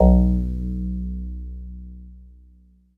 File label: Jazz Harp Kawaii B0; keys